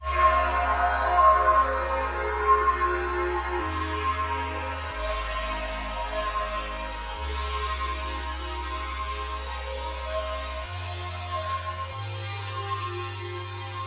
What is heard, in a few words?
wide open synth melody